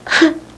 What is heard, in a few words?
whimper; Web Clip Empire 150,000